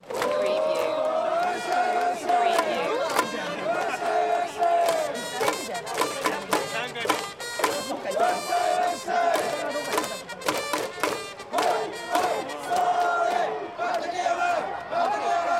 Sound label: Tokyo baseball crowd chant Japan; Swallows; lynne-publishing